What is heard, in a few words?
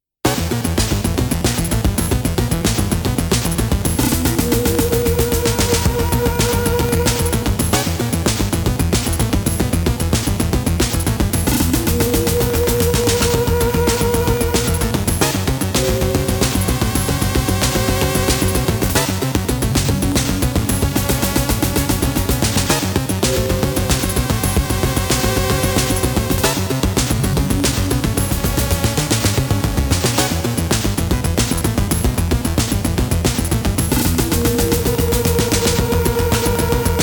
Level 3 Warning; Metal Gear & Metal Gear Solid music